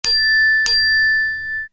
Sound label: Tin Tin; Samsung